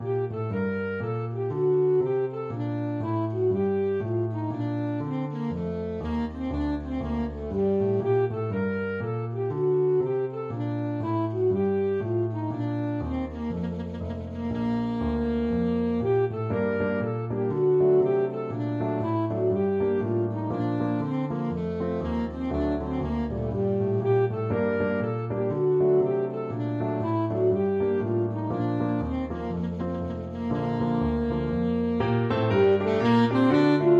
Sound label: boureeASAX, saxophone